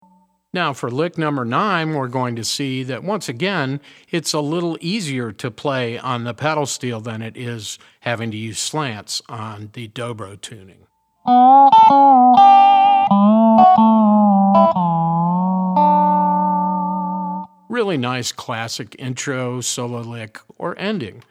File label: resonator pedal, steel guitar; mxr custom shop resonator ii pedal